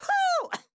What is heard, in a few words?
Moonwalk Cheer; eldorado